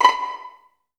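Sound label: strings pizzicato; ILIO; Kirk Hunter; Virtuoso Strings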